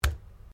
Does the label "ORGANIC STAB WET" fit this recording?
Yes